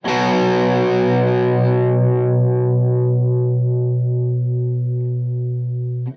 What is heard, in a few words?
Power Chord 2 Notes